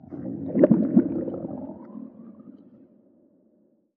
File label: Stomach growl